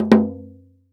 CHANGGO L, KOREAN PERCUSSION; AKAI S6000 CD-ROM - Volume 5

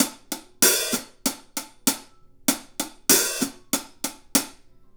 Hi Hat Pattern

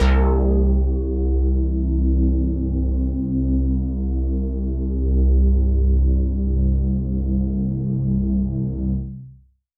Synth Prophet Bass C; Electronisounds

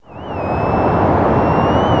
NOISE

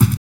JUNGLE GHOST; Spectrasonic Distorted Reality 1; RAVELAND RYE

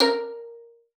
VIOLIN SPICC, A#4; Xsample VOL-5

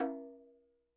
CH rm m, KILLER CONGA

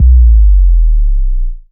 Sub Hit, Bass; Sonic Foundry (Sony Creative Software); Underground UK House & Electro

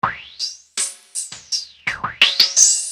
Filter Groove; Construction Kit; HIP-HOP; Sonic Foundry (Sony Creative Software)

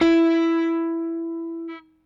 Rickenbacker Bass; Airwindows

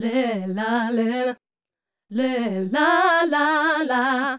Female Group, Hip-Hop and RnB Vocals

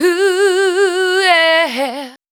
FEMALE, Dance Vocals

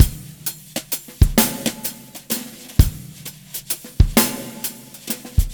drums six8 130; Quazibeat; Rock Heaven Drums

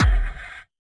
Drums; Distored Reality

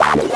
Distorted Reality; Spectrasonic; DIGITERROR

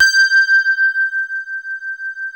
E RHODES; Best Service Advanced Media Trax Modern Composer

